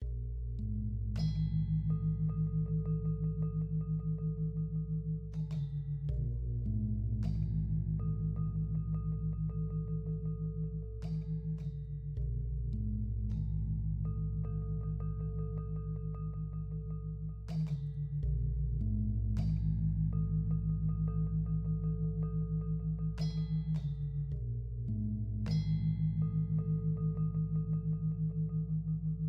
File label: Desert Music Stepping Stones Layer 2